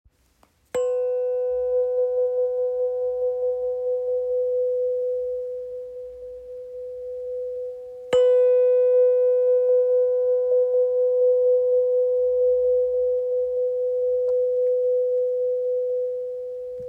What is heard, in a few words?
Wahwah; racine